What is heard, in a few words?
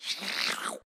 Attack; Beholder